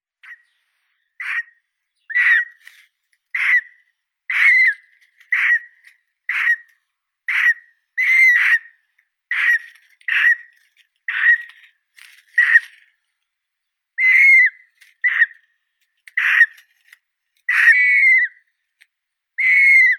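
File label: great bustard, Otis tarda, young bird; drofa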